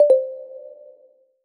error

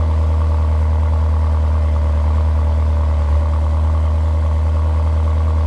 idle, engines; BMW M6

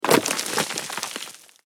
ore fall

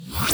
grav shot; weapons

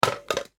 trash

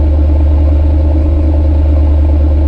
idle, engines; BRABUS CLS

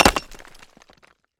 pickaxe hit

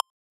message

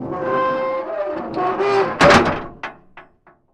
turtle emptying

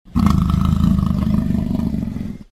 lion; rise hunting; animals

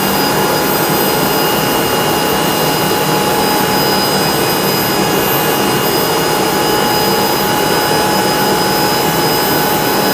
turbo, vehicles

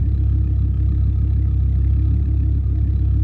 idle, engines; RS3

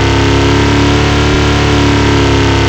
high, engines; rise vehsounds; S63

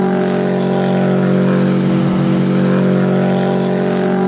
high, engines; SUBARU

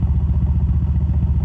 idle, engines; rise vehsounds; MANSORY

